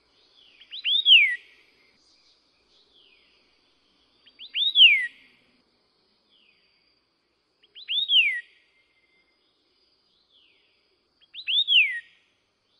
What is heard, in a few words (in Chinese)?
白耳畫眉; 白耳奇眉; 畫眉科/留鳥